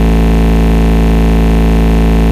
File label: Bass Root A